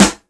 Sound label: short snare drum g key